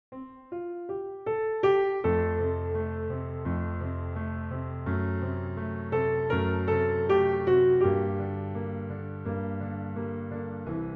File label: piano